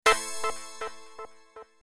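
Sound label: button bonus collect click; Golden Barnyard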